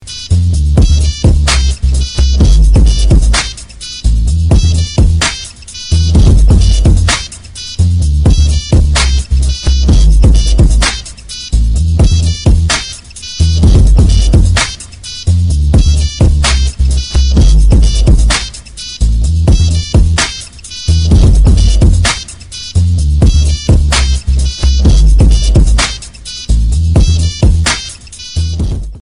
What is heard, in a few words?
nokia gangster tone ringtone